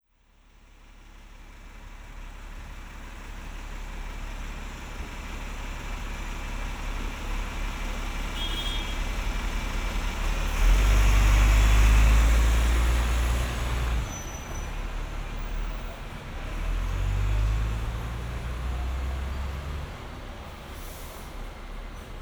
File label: Pure Binaural Recording - Street Sound Hong Kong TST Star House Bus Station